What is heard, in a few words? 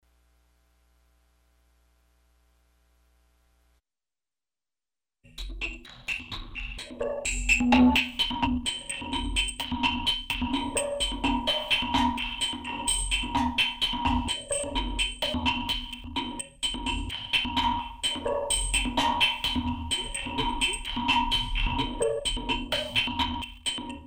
percussion